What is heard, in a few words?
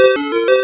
Score Tune; Shooting Gallery Master System